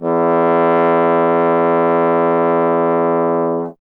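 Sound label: bass trombone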